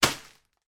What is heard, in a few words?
plastic bag of potatoes catch in hand